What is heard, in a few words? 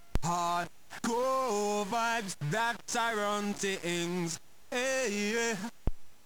sbpro2 opl3